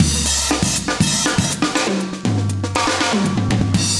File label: 120, loops; Sound Effect Library